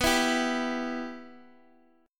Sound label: B Suspended 4th Sharp 5th, Guitar, Open D